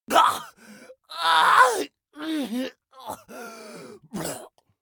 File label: scav death; eft